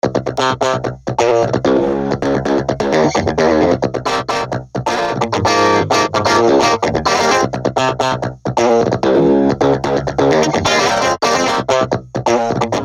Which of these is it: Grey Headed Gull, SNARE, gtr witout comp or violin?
gtr witout comp